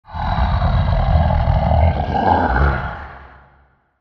Cat Growl; Eerie And Lowered, With Reverb. Use For Monster.; Animal Vehicle Growls